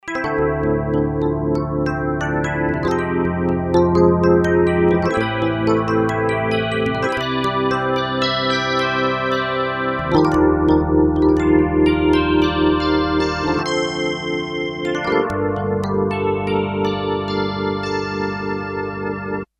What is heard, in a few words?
PowerEP, Kawai K5000S